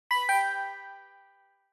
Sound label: Quiz Button Multi